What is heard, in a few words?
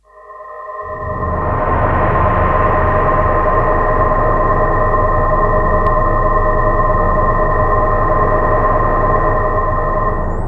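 FX left, attacks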